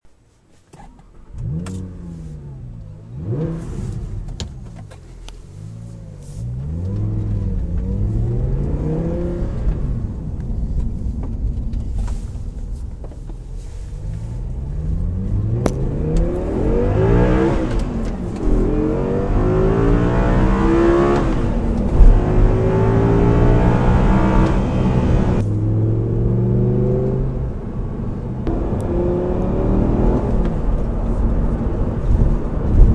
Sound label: audi r8 v10